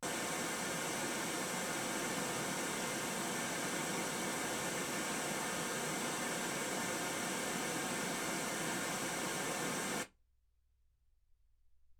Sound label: wgn 48kHz